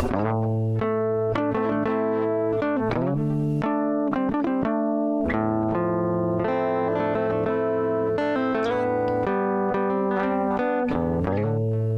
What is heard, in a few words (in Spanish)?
Sample Guitarra Compresor